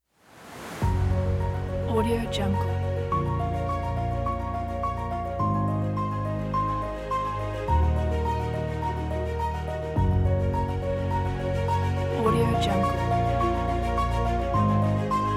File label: epic intro music kit